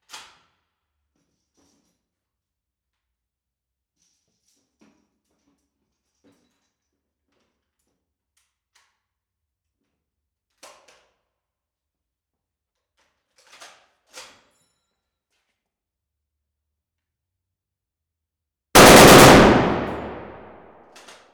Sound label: Medium Warehouse Browning M2 .50 Machine Gun Burst x2; 03m RSM191 MS Raw; Audiobeast; The London Warehouse Firearms Library